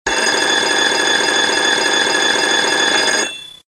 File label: RING LONG